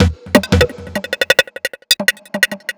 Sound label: Mixed Percussion, percussion loops; KJ Sawka; Drum n Bass & Beyond; Drum Tool Box